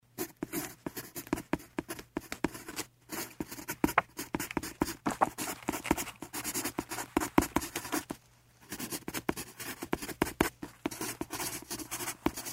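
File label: writing with pencil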